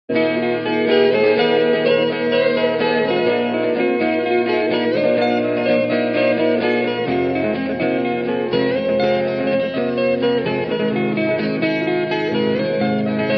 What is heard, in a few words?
Acoustic medley; Last Ninja2